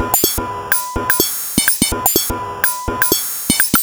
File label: 125bpm, House Drumloops